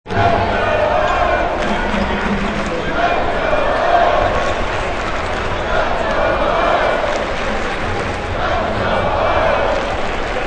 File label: General noise from arena crowd; Let's Go Hoyas; Arenas (Sound Effects:Backgrounds)